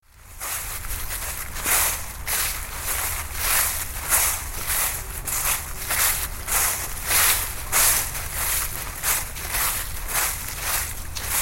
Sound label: leaves